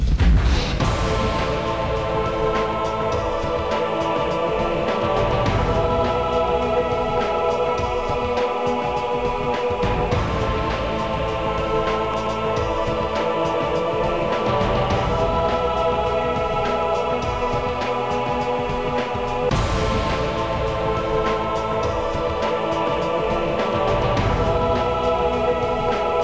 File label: cool music; openffa